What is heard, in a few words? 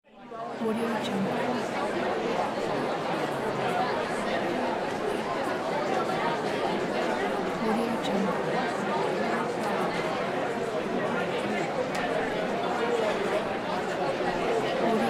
crowd noise